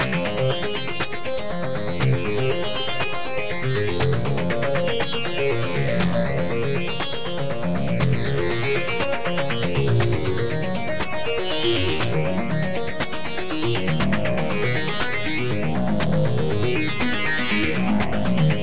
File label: arpeggio